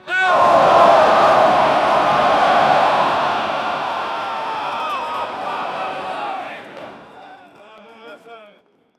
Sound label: crowd